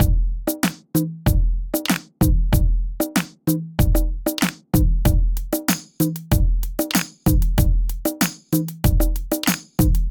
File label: acoustified kit; Ableton; Bomblastic